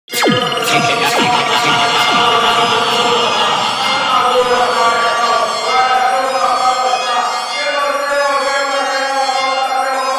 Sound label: Brazilian Phonk